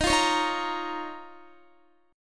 Eb 7th Suspended 2nd Eb, Guitar; 4E,4A,5D,5G,5C,6F; strummed

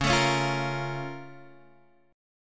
A sharp Minor Major 7th

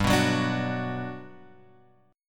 G Major 7th, G; GM7 Chord {3 2 4 X 3 2} strummed

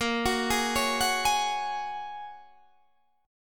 Bb Minor 7th Sharp 5th, Guitar, DADGAD

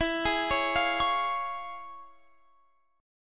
C sharp Major E

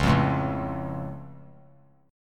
Db Augmented, down; strummed; JGuitar

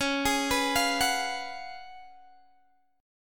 C sharp 11th C sharp, Guitar, Open G

